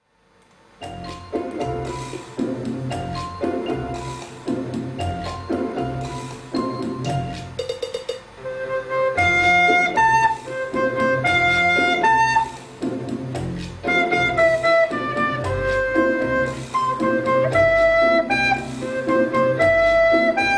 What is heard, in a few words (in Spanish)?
LA CUCARACHA FLAUTA DULCE